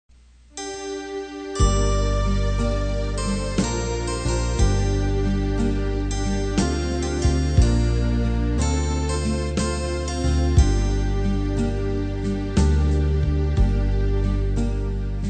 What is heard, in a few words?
BASS